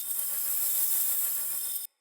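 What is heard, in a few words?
Team Heal Projectile Loop; Enemy; Sinecure; Melee Grunt Dual Agent; Abilities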